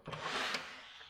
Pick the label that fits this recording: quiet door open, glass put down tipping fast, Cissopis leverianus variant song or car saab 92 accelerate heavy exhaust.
quiet door open